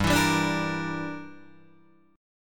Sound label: G Minor 6th, Guitar Open D